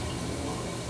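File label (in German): einfüllen sprit; PanzerE; Desert; CD-Gamer 1999-10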